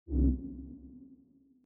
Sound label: wuf